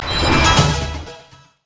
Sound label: orb of deception; LoL; ahri skin04